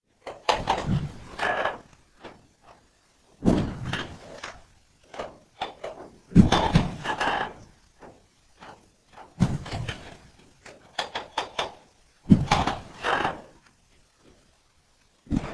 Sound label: rug